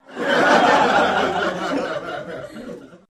Laughter Med Crowd In; medium

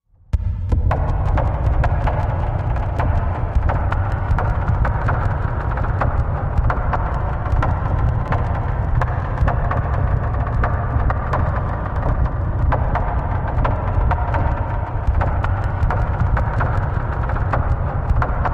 Boards In Wind Dist Wood

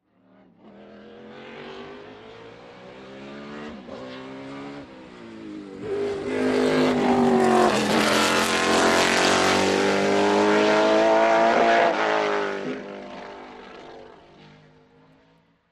Sports Car By Turn

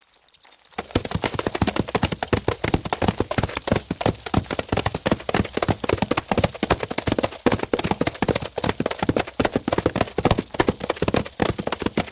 Group Horses Run Fast, Foley Effects

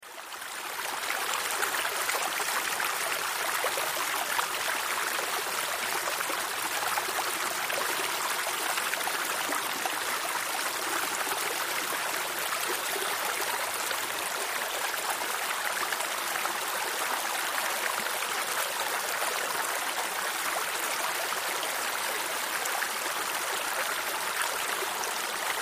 Waterfall

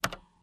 Computer Keystroke, keystrokes

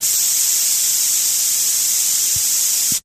Spray, Aerosol, Air Freshener, Constant S

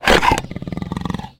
Leopard Snarl Inhale; big cats

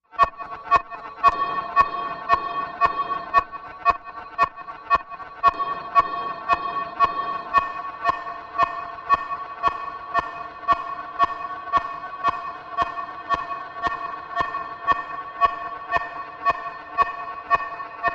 Concern Compressor Ma, alarms

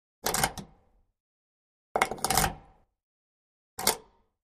Keys, Latchkey Into Armored Door x3

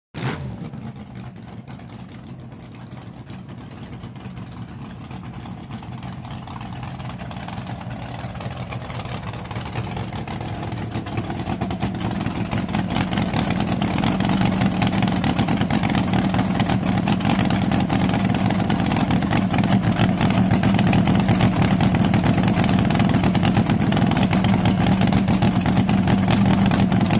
Drag Boat Start idle

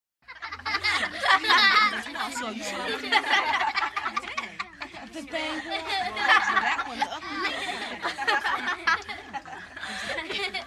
Group Kids Laughing S

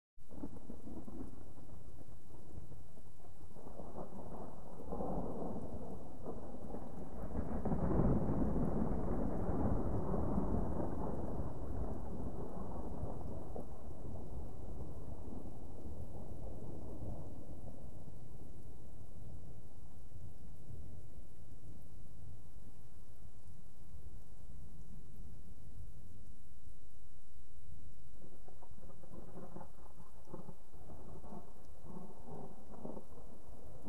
Thunder Gentle Thunder, distant

